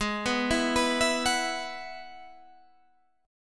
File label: G sharp Minor 7th Sharp 5th